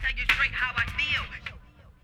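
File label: vocal, Ab, Hip Hop & RnB; ProSamples vol.34 - Hip Hop & RnB 3; How I Feel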